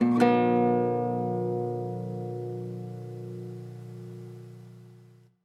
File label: Guitar Chord